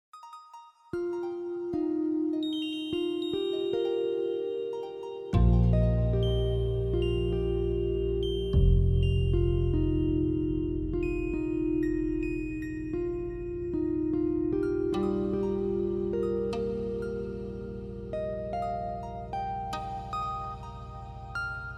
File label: Enchanted Harp